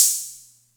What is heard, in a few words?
Music Percussion Computer HH open, Drum Machines